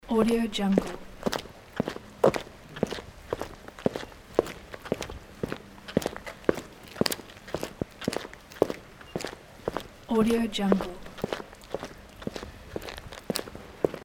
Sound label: indoor steps on concrete